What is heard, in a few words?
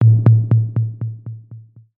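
sounds of endings outro, disappearance fading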